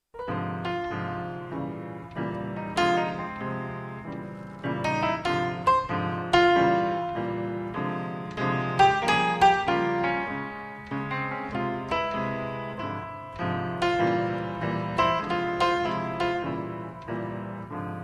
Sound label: brass piano bar